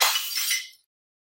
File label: Window Break; SoundsCrate